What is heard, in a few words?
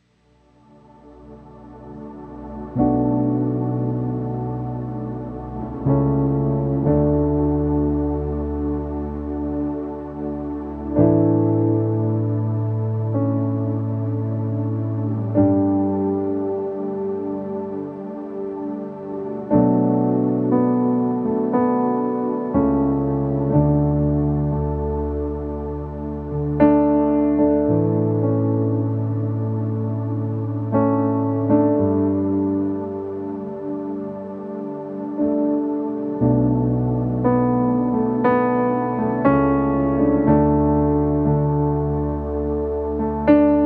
Instrumental Worship